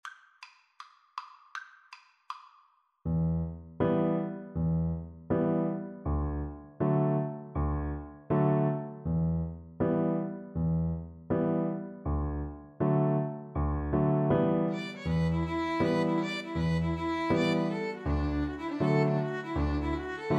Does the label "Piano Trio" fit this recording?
Yes